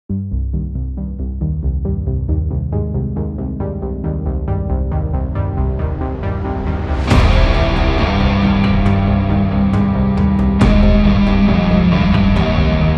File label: heavier 7 strings